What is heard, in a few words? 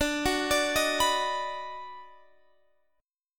D sharp Minor Major 7th Sharp 5th D